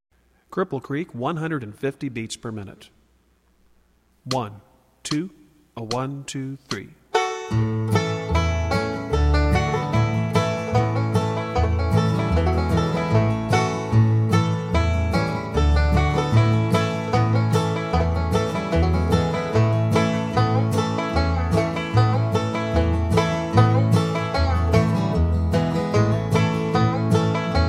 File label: Cripple Creek, 150bpm, banjo